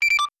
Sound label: local end, radio sounds; Task Force Arrowhead Radio; teamspeak; plugins